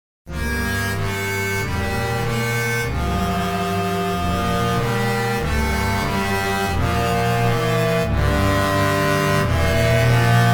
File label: unknown instruments, synthesizers